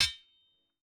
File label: Sword Clang; soundscrate